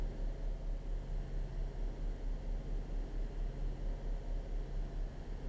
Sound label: Ambient After; Game rip; MiSide